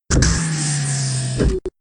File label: decompress release; SSV-Music